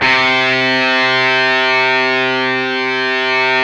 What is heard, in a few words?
GTR, Dirty; Club-50 - Foundations; Paul-Stack